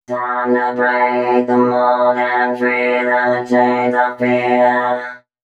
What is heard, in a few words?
female c#, Dance Ultra Voice FX; Mutekki Media